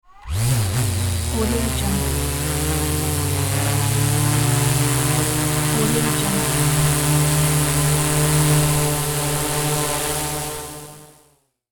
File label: big drone fly